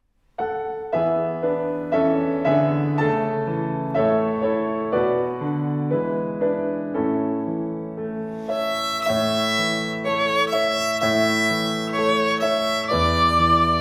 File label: Oh Come Little Children Performance Strings